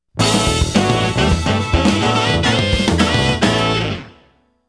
Chao Race Goal; Sonic Adventure (Unreleased Tracks)

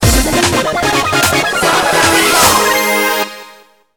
Carnival Music; SEGA; Sonic Riders

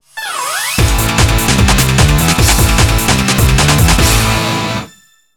Carnival Music; SEGA; Sonic Riders

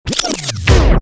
Carnival BGM; SEGA; Sonic Riders

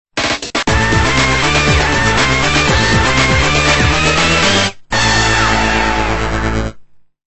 Jingle - Deep Core Clear; Sonic Rush Adventure Original Soundtrack

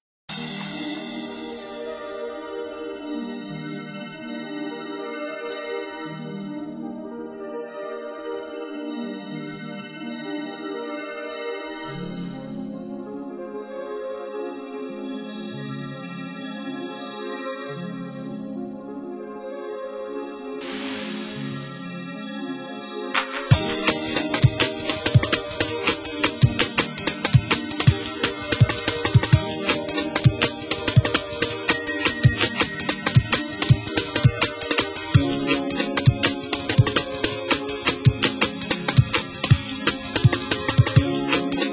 Drum and Bass Mix

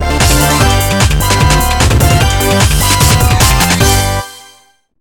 Carnival Music; SEGA; Sonic Riders

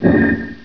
DENIED; Sonic R-SAT

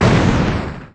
FIRE; Sonic R

